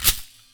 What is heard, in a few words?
Rattle